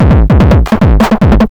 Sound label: MD 909 150, Hard & Loud Techno